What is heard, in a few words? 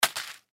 Box Plastic Push, Box Push Pins Set Down Drop; Rustling; Objects; Blastwave; Foley